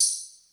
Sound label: rattle, Drums, Kurzweil K2000